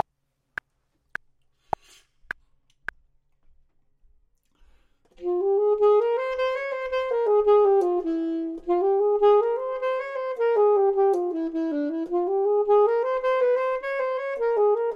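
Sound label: bottom, Saxophone and Clarinet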